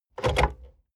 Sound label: plastic storage large close